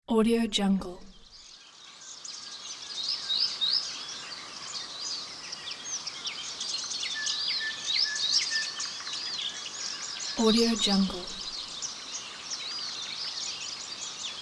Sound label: nature sounds